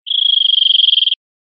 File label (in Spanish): absorbidos, verderon